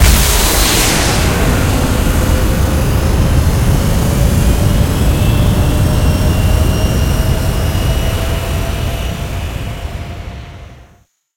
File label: boost, vehicles speeder; Quake 4 Alpha; id software